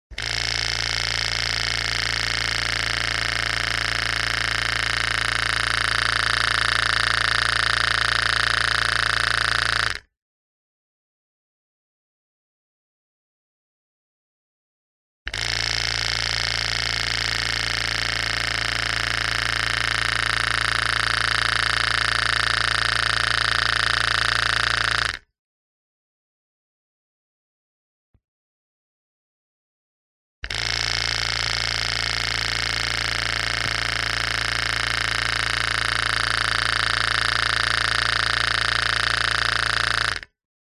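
machine compressor on off short bursts contact mic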